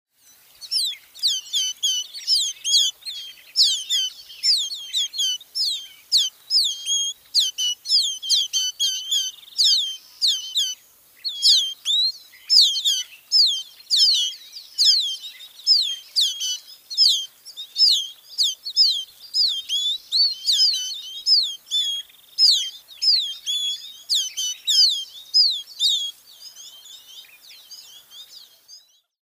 Lawrences Goldfinch Spinus lawrencei

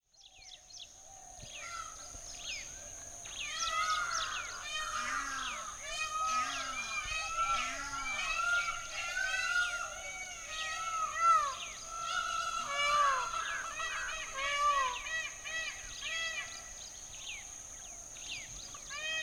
Indian Peafowl Pavo cristatus

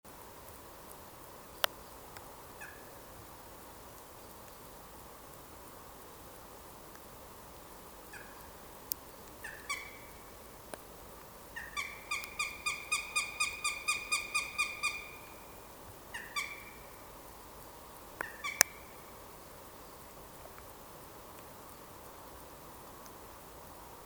Northern Goshawk Accipiter gentilis gentilis

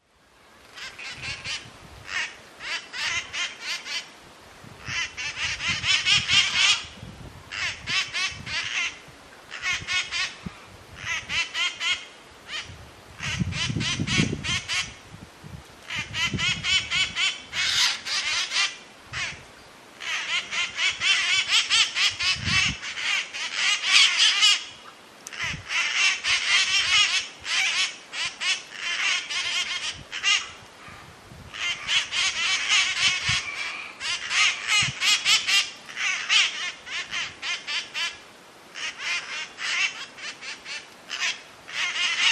Red masked Parakeet Psittacara erythrogenys

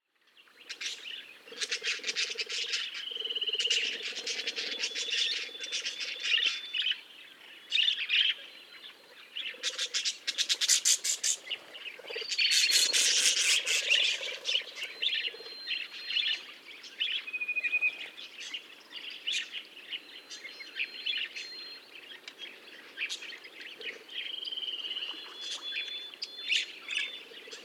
Budgerigar Melopsittacus undulatus

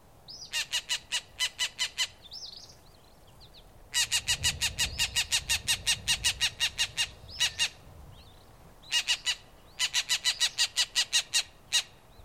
Green Jay Cyanocorax luxuosus

